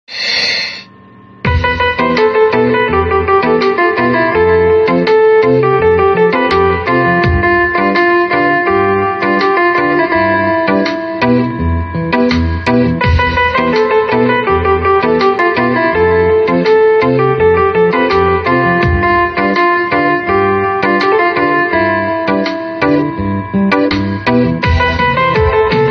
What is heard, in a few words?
piano piano